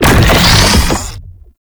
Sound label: Slinky Dead Zone Charge; Warframe; ManInTheWall